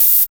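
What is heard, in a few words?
open hi-hat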